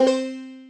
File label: PUZZLE Success Banjo Two Note Fast; stereo; Universal Sound FX